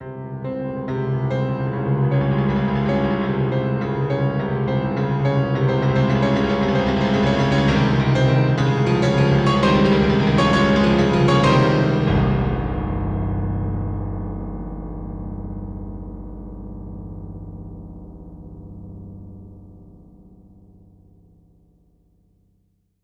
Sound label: Jingle- Drowning!